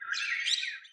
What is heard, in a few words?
Birds